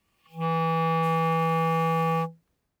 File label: Bb Clarinet ff E3 stereo; Woodwinds